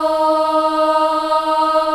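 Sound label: D#3 Choir "ah", Right; CHILD AHF; USB Soundscan vol.28; Choir Acoustic & Synth; AKAI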